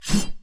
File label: dodge